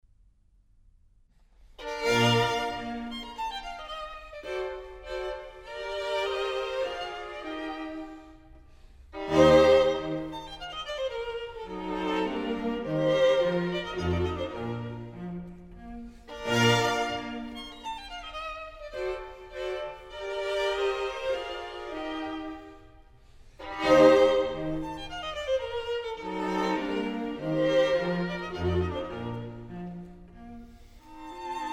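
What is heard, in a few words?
String Quartet No 22 in B Flat Menuetto; Wolfgang Amadeus Mozart; Mozart String Quartets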